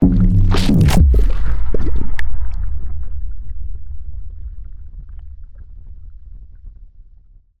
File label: Sci-fi, Water, Hit, Punch, Impact, Bubbles, Granular; Mattia Cellotto; Water Volumes